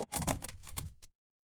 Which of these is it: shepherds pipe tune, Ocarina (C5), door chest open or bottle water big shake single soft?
door chest open